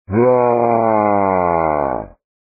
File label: WAA